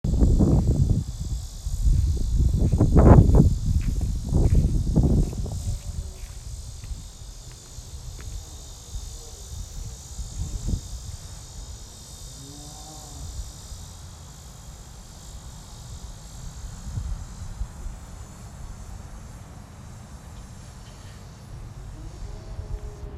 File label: cicadas at stone root